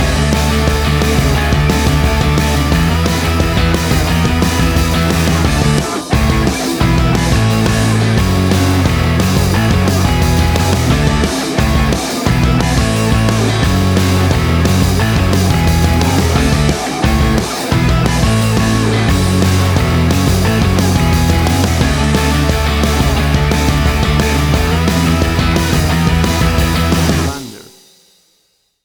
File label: Punk Rock